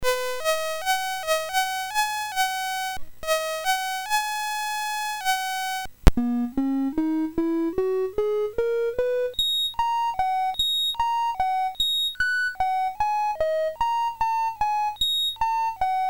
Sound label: BASIC, MOS6581R3